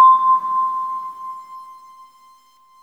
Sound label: musica C5